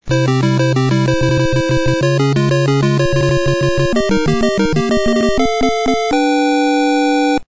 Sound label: win; mario2; svencoop